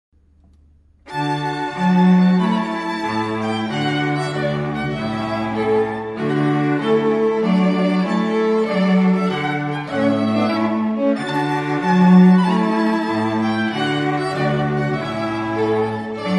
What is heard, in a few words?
trumpet tune